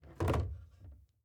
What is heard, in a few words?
Bathroom Cupboard, open; Cupboard Door; Haunted Bloodlines; SFX Producer